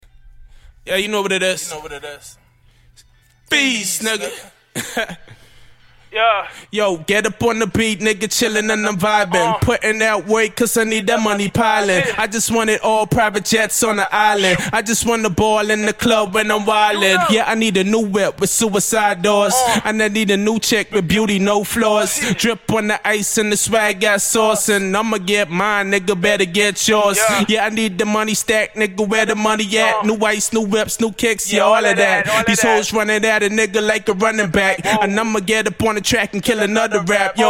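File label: hip hop 94 C minor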